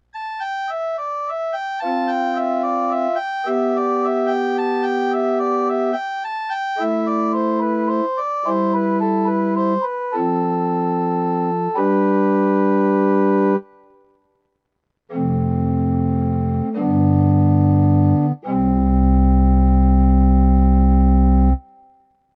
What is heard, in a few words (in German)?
Numa Compact 2x Orgel